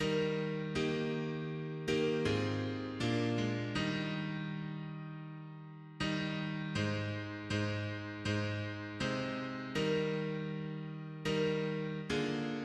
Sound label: mono piano, hymns; Quelle grace au Seigneur